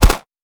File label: 9mm smg shot